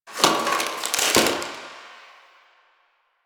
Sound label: Box Of Trash Rustle; Warframe; Ambience; Nineteen Ninety Nine